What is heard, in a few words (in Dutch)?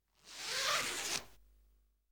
Rits Naar Boven; KMP Film Video Sound Effects